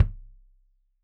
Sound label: Kik Stereo; Deeply Sampled Drums; SMDrum